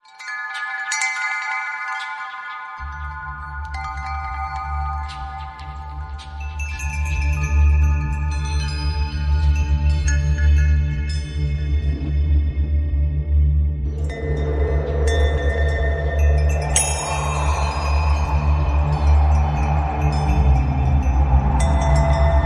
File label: Ambient